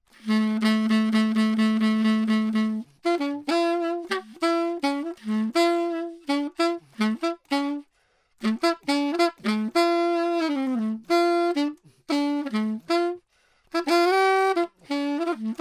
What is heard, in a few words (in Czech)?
jazz saxofon